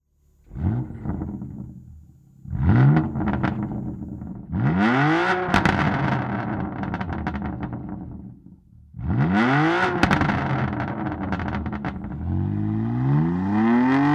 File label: Downpipe BMW M2 Competition